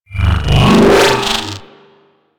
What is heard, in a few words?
Mine Osprey Reload